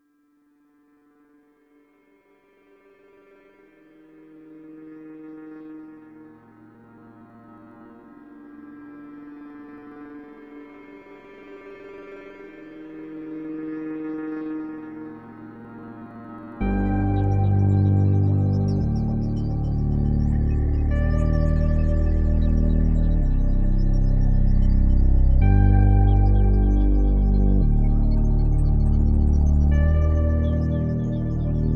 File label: industrial